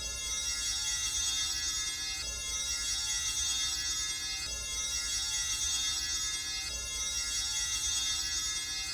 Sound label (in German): Glocken; Spielwiese